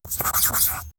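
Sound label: sound of a single straight, stationery; stationary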